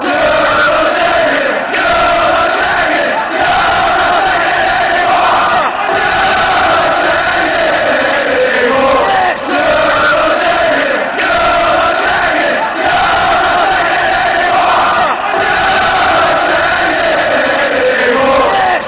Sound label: not singing anymore, chants